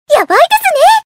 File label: combo break